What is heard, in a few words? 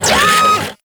Thumper Bot Servo; Warframe; Grineer; Movement